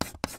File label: chalk writing